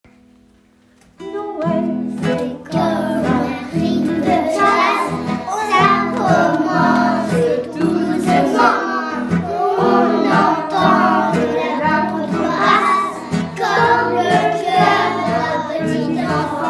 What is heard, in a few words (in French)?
noel jazz classe maternelle